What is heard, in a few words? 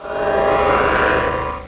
Warp; MROIDS10